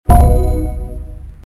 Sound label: new alert, ringtones